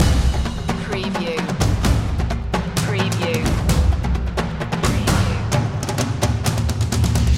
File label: storming percussion loop